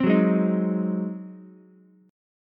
Ab 7th Ab down Ukulele; Ab7 strummed; JGuitar

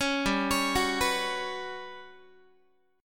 G Major 7th Flat 5th, Guitar; GM7b5 arpeggiated; 16,15,16,16,15,x

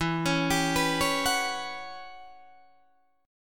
E Minor 6th Add 9th guitar chord, Open D

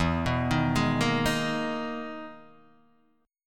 E Major Sharp 11th E, Guitar, Open D; chord shape

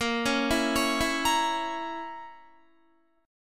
A sharp Diminished Guitar, chord; A#dim; arpeggiated

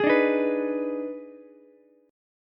Eb Major 7th Suspended 4th Sharp 5th Eb, Ukulele; strummed; EbM7sus4#5

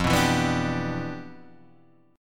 G 6th F; G6/F strummed

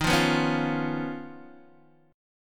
Eb 7th Suspended 2nd Sharp 5th Eb, Guitar Open D; x,6,3,5,4,3; down

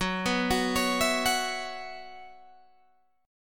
F sharp 7th Suspended 4th, Guitar, Open G

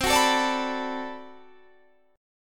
B Minor 7th C, chord

